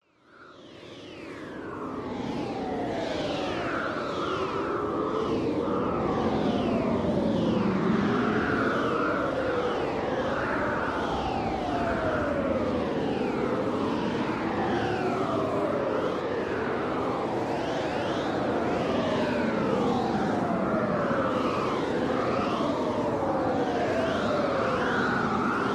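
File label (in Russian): магия, магический звук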